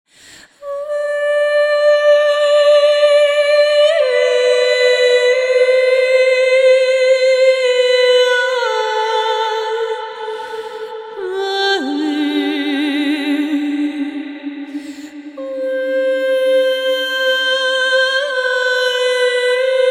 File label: Outro Section Vocal - Am 65Bpm (WET); Construction Kits; VOCALS; Ghosthack; SkyRealm; Kit 27 - The Crossing Of Jade Sea